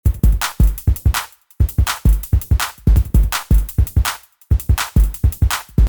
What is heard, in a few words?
Beat - Acoustic Drums Pad; CRTIV Shumovick; DaZone Beat